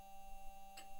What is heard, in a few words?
mic, mars2020 edlcam